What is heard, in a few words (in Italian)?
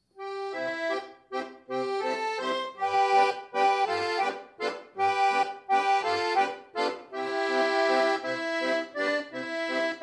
Serenata Aviglianese; Club IIIM